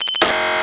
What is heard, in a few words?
button